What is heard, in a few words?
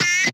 Guitar Chop; Curse Crackers For Whom the Belle Toils